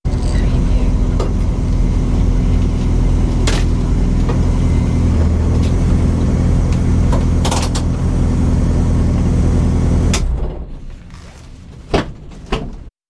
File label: Pulling up and cutting the engine inside a rally car; beanstalk audio